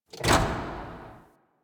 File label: Worker Lever Pull; Warframe; Venus Open World; Venus Solaris United